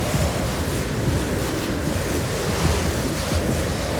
summonerspell base ghost; LoL

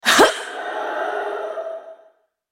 effort; BlackIceAnivia

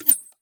frostshot; LoL; ashe

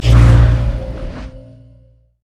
mana barrier; LoL; blitzcrank

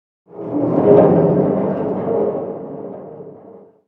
big chains groan

